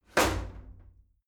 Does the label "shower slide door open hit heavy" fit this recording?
Yes